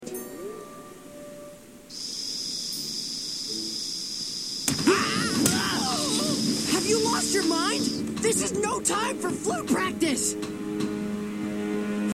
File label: flute practice; sokka quotes; book 1 water